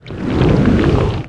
magic

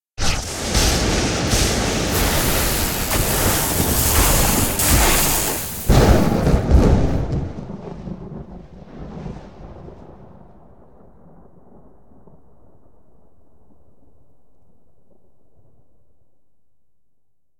Violent Lightning; SyrinScape; Temple of Urgathoa

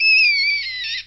scrm; The Elder Scrolls III - Morrowind; rat

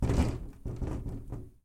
Rattling Window; SyrinScape; City gone mad

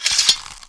bow away; The Elder Scrolls III - Morrowind; Morrowind GOTY GOG

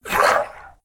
Ghoul Growl; SyrinScape; East Ward

